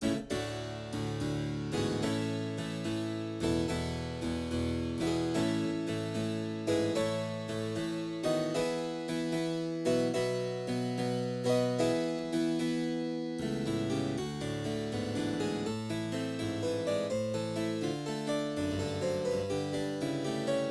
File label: Toccata